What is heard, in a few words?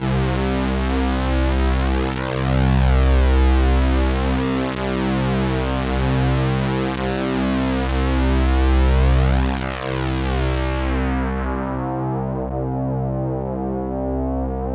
CLUB ORGAN, Roland JV 2080